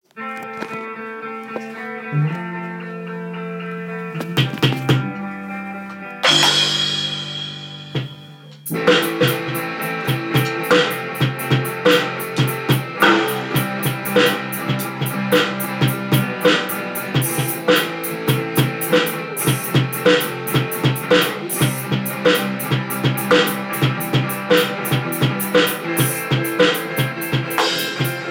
drum

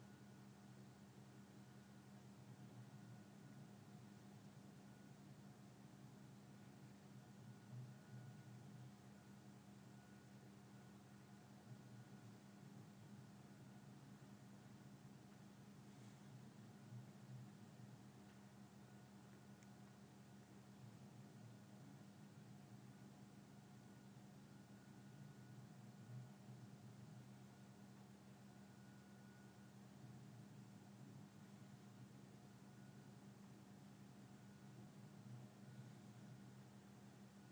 office ambience with neighbour's cnc machine

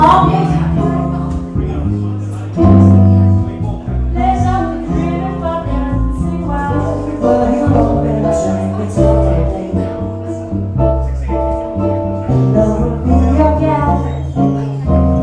jazz

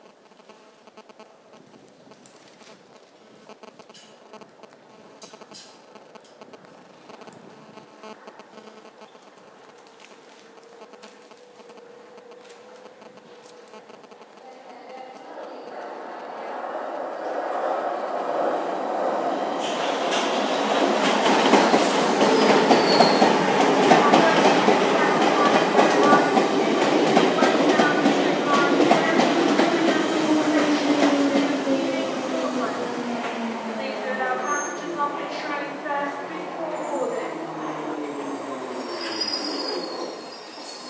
trains stopping at St James Park tube; uksm

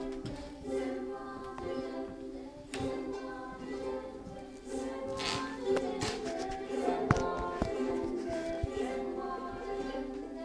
ukelele

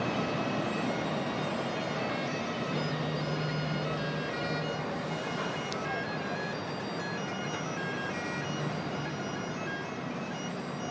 bagpipe; edinburgh

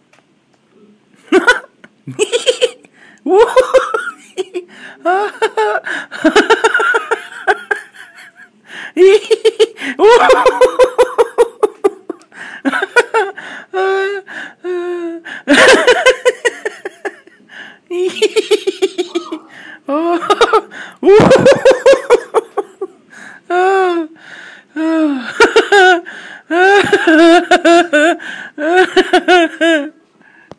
giggly girly giggle

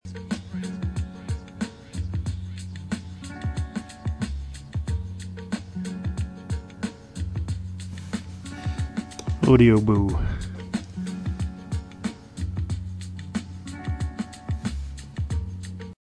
beats

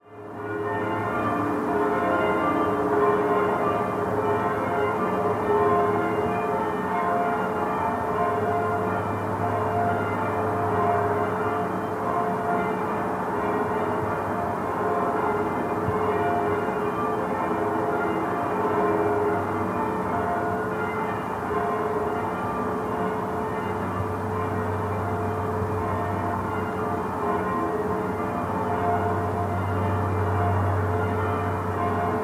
church bells and gravel tracks; Cumnor, Oxfordshire

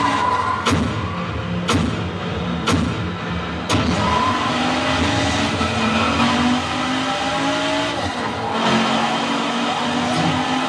sound fx; blur on xbox

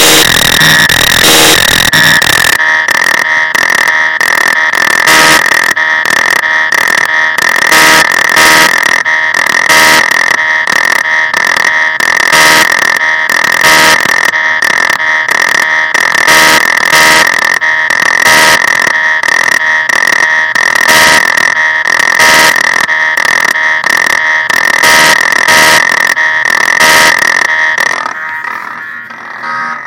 dual cyclotron polivoks

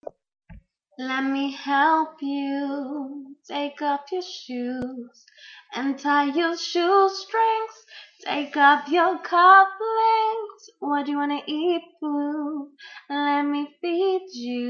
singing

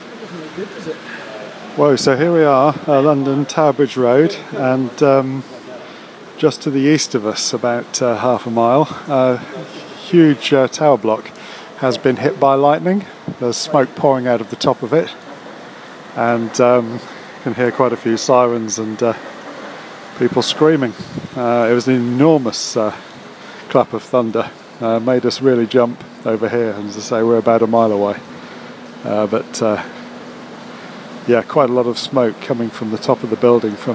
lightning impact; south london

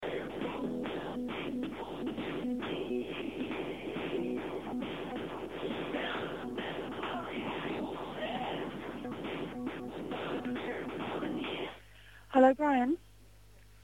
music on hold; O2; squash

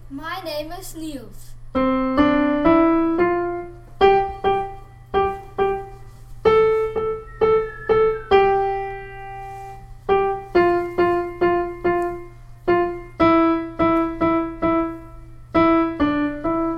piano

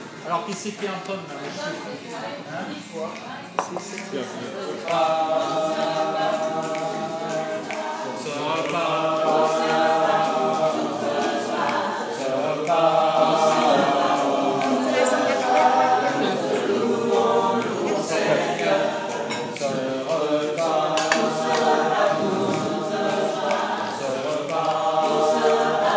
singing grace; with french church planters

